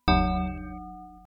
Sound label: bells